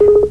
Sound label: Comm Bleep; TNG